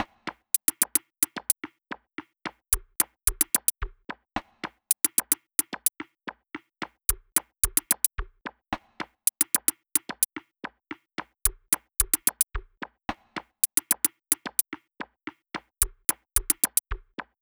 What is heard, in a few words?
drums